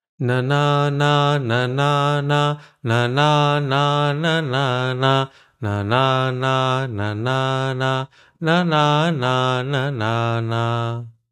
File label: Bhujanga tune